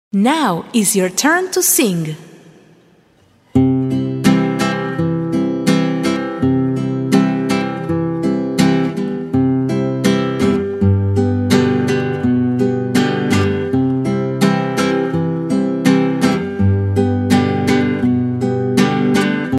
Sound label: 6 little ducks KARAOKE